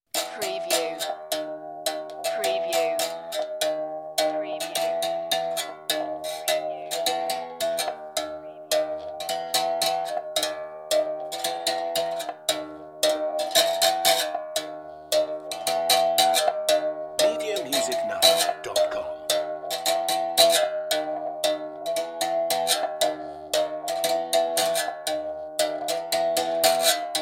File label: Berimbau Long Version